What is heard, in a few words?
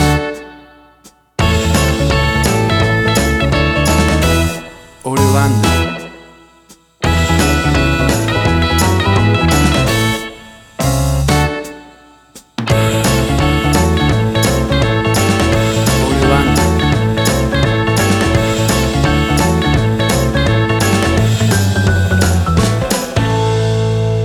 60s Bounce Pop